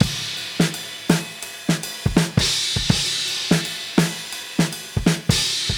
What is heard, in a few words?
83 China Combo, Live Jungle Breaks; Death By China